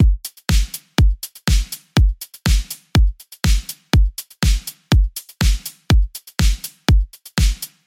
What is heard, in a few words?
122 BPM Battery Full Groove, full drum kit; Alchemy